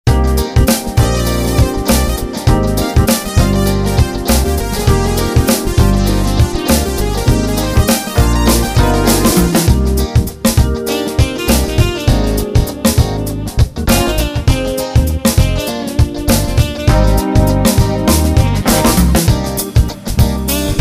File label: grv motion